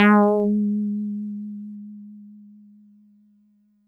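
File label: Mono Bass DX100 G#4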